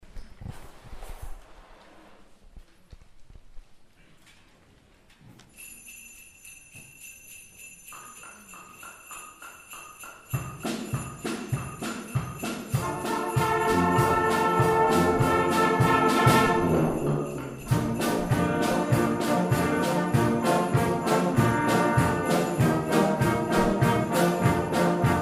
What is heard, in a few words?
Jingle Bells; bonesskolekorps; Julekonsert